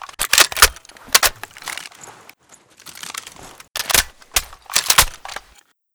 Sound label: Hk reload, assault rifles; 556mm HK33